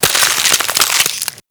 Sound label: Ice Freeze Hit Effect